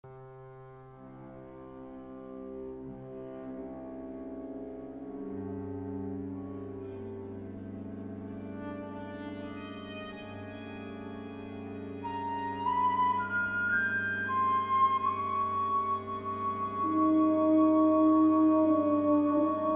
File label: Piano concerto; McCabe